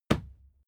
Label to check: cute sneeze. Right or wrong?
Wrong